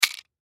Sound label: Sun Glasses Drop, Sunglasses Drop Cement; Foley; Blastwave